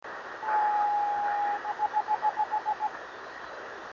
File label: 9 dots 26660KHz